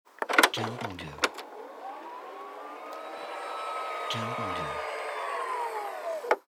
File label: Car Trunk Opening